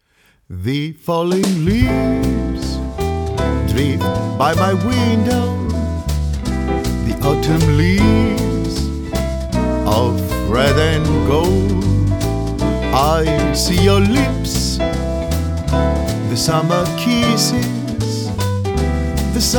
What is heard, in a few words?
jazz